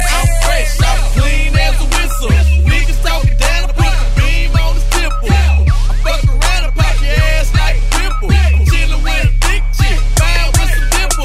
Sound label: whistle